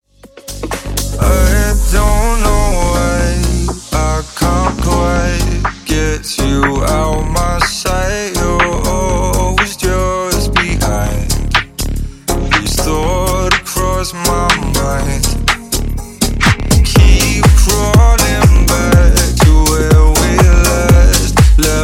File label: Funk Remix 124Bpm